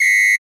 Long Whistle, percussion; Roland BK-5

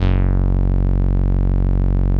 505, String Machines

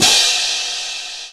Orchestral Cym

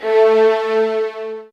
SOFT VIOLINS; Q Up Arts; Denny Jaeger Master Violin Library

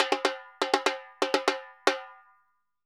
Repique Poly 120, Drums & Percussion; Best Service; Festa Latina VOL-1

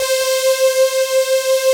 BRUSH SYN, DANCE; Pro-Rec - Synthsonic; Z1 DANCE

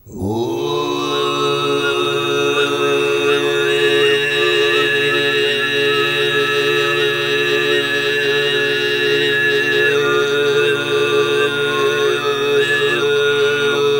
GRP, TUVAN GROUP; Spectrasonics Vocal Planet CD5; World Voices

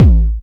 Kick, dry kicks; Quasimidi Rave-o-lution 309

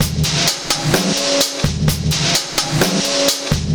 Easy Tiger Loop, Drum Loops Compressed; Zero-G Smoke

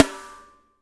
PIC SN, DRY KIT; Spectrasonics - Burning Grooves CD2

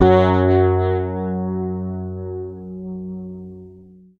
F#, Bass; Sampleheads; Will Lee Bass Library

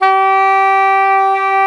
SAX G2, SAXOPHONE; USB Soundscan vol.63; Balkanish Traditions; AKAI

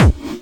hardbassdrumz, breakcore; breaks; Bazzdrumz

